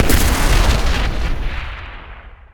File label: big Win Start; netent; reelrush